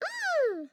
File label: koala exclaim